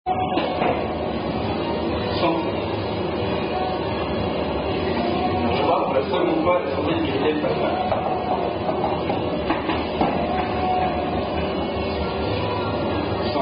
Belguim Version Of Are You A Cardinal Drinking Game